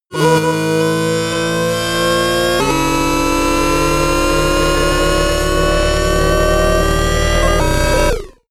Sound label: racing car synth